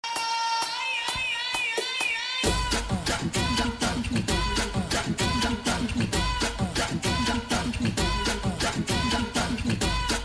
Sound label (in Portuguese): ao vivo na roda de funk